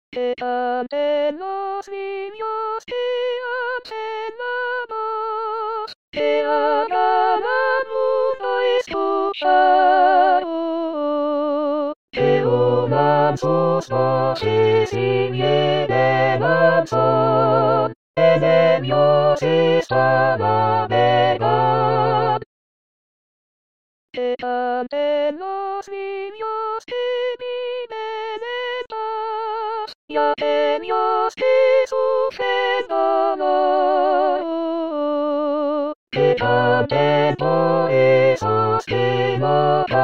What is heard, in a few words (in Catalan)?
canteninos todos voz